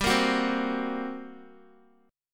G Minor Major 7th Double Flat 5th, G, down, Guitar, 4F 4A 5C 5G 5C 6E; strummed; JGuitar